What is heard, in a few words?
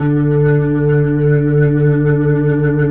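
2D R, Slow Fast Hammond, Organs; E-MU Producer Series Vol. 5 – 3-D Audio Collection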